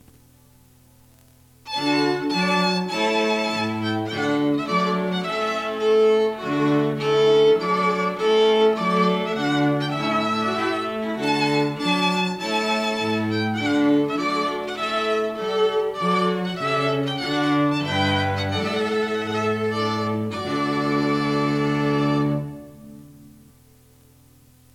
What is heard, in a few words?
Trumpet Tune